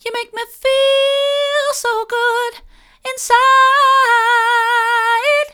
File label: mid, vocal